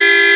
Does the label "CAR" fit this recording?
Yes